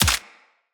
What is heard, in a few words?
Bullet Impact Head Hit Notification; Weapons